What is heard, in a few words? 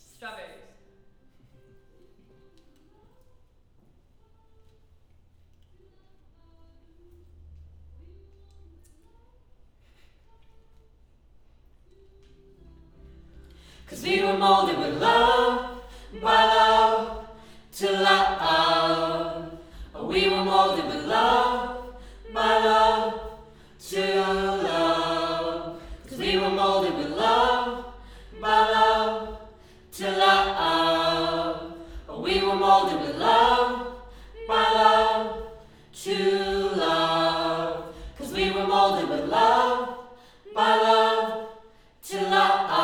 Choir Mid